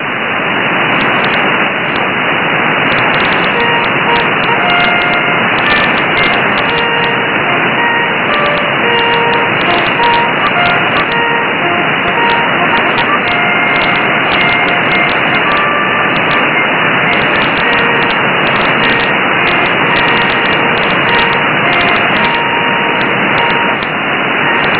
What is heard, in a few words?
Retreat 13150 kHz